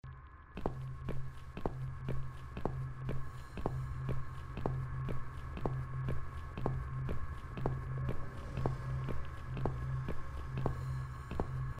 steps; The Cat Lady

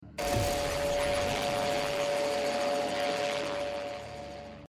drones; risk of rain 2

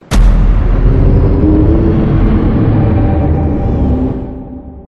death

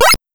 shot; Mega Man; gamesoundopedia

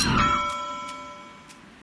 crafting ready; Don't Starve

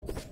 celeste sound ground jump

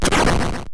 death; inside

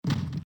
barrel close; baldursgate

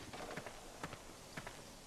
crouch slow; Metal Gear Solid 4; gamesoundopedia

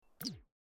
toggle pause; sims 4

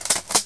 reload; Tomb Raider PS1; lara

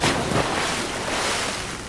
dodge water deep; Dark Souls III

